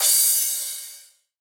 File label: Crash